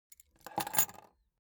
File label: key key ring small place table soft; foley; smartsoundfx